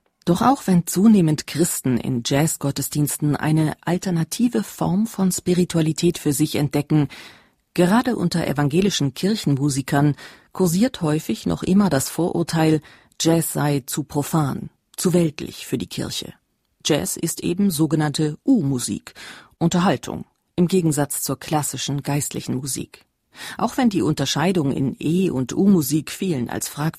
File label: Jazz; Bayern2; Jazz und Kirche